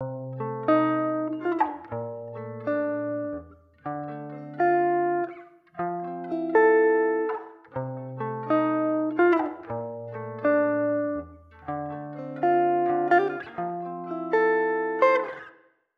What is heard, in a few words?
Room reverb example, guitar